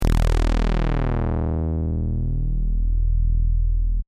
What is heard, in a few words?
Detuned saws with svf sweep from 20kHz; Filters for synths